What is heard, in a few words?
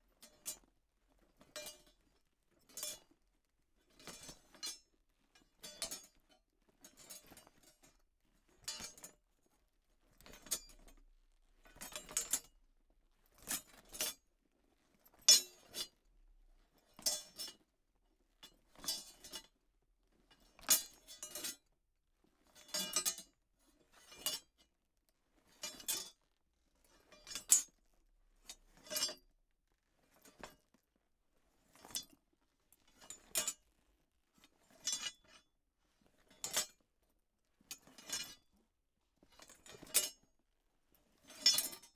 troops metal layer movement